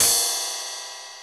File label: LVRID, MPC-60 DRUM2; Greytsounds VOL-1